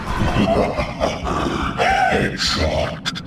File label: Hahahaha Headshot